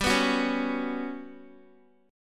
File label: G Major 7th Flat 5th, Guitar; strummed; JGuitar